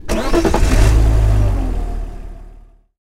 Prop Car Start; BTD6

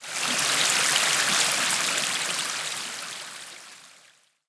Prop Fountain

